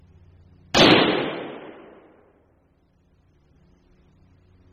reverb hall; pomona; bridges

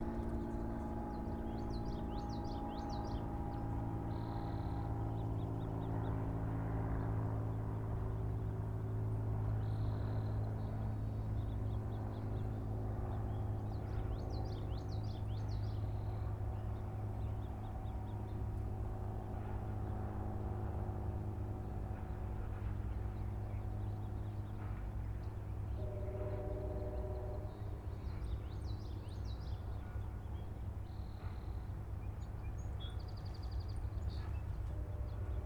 soundscape Field Station

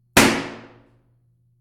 Balloon Pop; Atwood

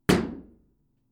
reverb Music Room; Harvey Mudd; Platt

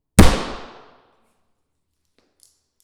reverb bathroom; pomona; millikan

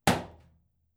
reverb elevator recording; pomona; ITS

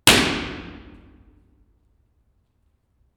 reverb foyer; pomona

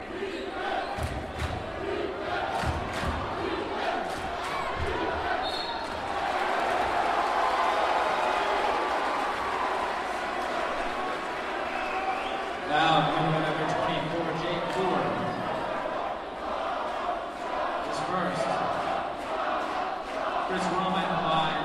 Soundscape rains basketball; Pomona

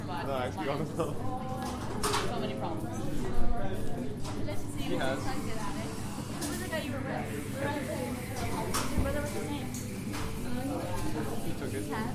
soundscape Crowded Cafe

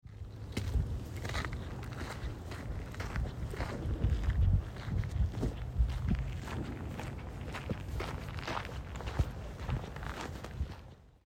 Walking on Sand

